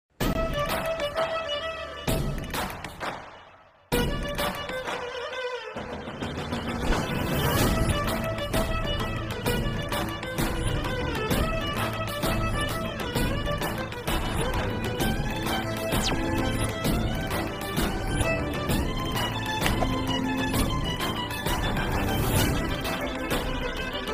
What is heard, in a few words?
romantic bgm; santosh sobhan; mehreen pirzada; maruthi